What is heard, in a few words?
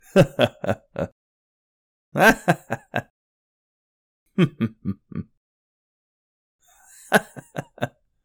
Various Chuckles Male